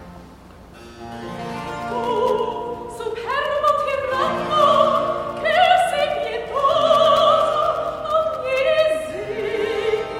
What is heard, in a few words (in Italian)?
Recitativo; SCARLATTI; Il martirio di S. Orsola; c1695